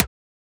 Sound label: Transient; FLPRO